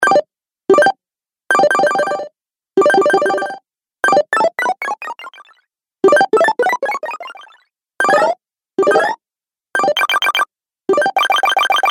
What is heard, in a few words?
Retro Videogame Fx Sounds; Video Game Sound Effects